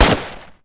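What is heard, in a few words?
Swat submachine gun Fire; Playable Tutorial Level; TR3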